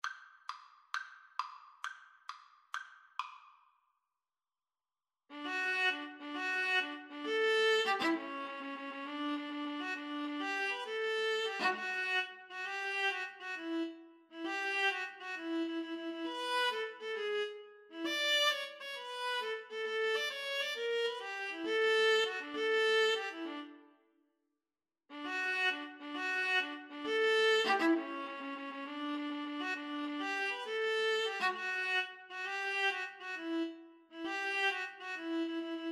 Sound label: viola viola